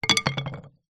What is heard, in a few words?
foley wood small pole down on wooden decking; zapsplat